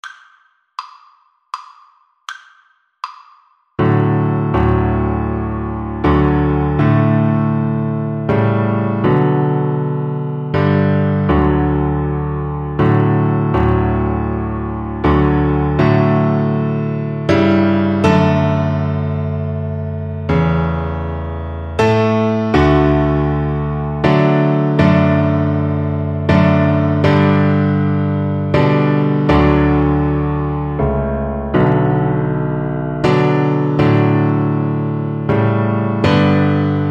amazing grace, Double Bass

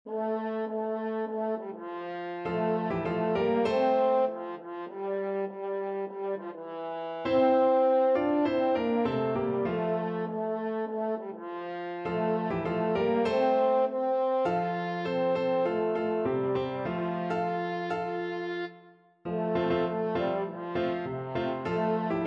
french horn

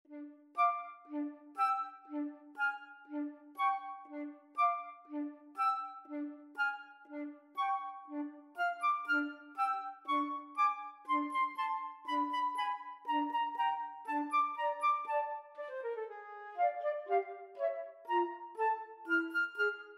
tchaikovksy sugar plum flute duet

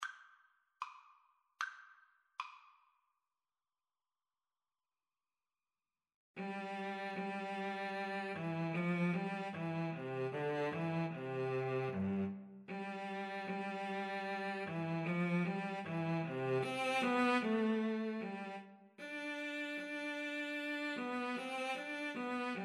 Cello Duet

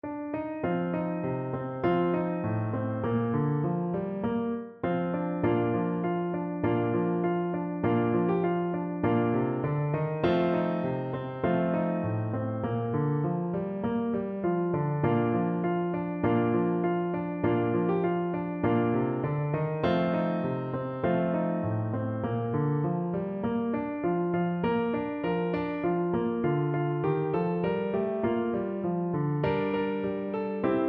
the caissons go rolling along, Trumpet

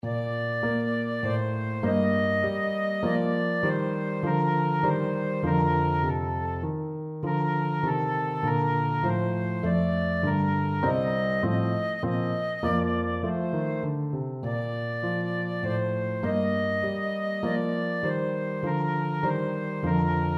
minuet, flute

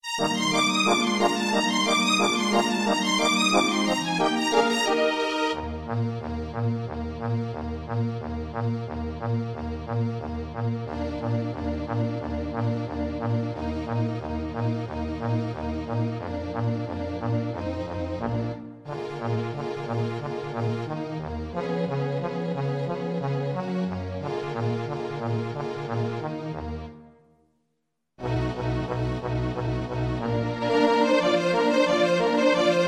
Euphonium

